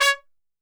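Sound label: C#3 trumpet shot, Brass Super Section; Best Service; TRP SHOT; 90 s Sample CDs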